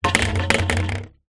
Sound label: household swimming pool net pole metal down on surface